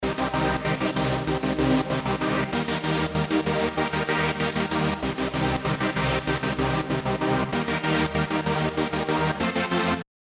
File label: Without Cyclosonic Panner